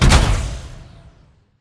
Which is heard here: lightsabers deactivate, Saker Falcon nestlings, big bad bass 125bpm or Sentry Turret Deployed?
Sentry Turret Deployed